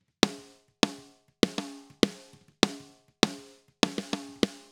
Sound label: SNR COMP